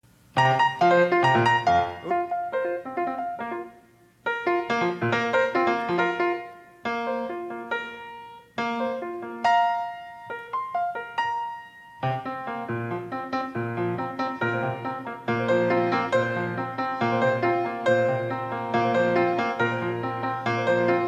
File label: disklavier